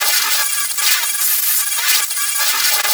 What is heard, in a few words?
INTERFERENCE; Spectrasonic Distorted Reality 1